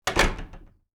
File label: door close